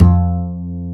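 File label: double bass F2, Jazzistic; Univers Sons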